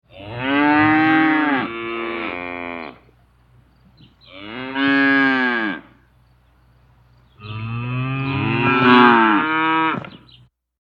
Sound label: Cow Chorus; Cows (Sound Effects:Animals)